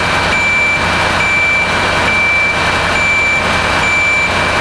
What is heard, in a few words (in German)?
rueckfahr